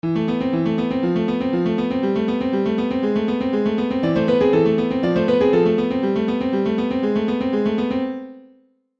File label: Piano Test